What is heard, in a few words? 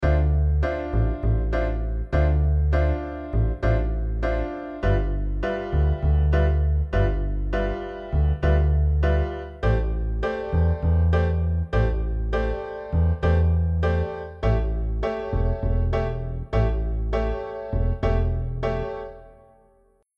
bossanova